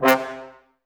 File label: Brass, Woodwind Brass Ensemble